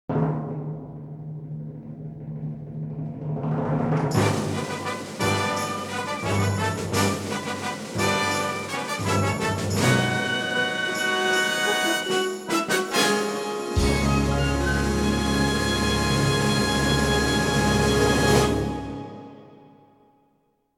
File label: Fanfare; Network Production Music; NM102 Reaching For The Top (Multimedia)